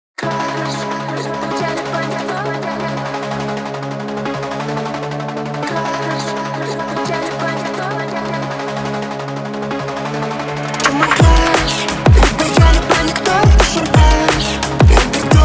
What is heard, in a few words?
DnB remix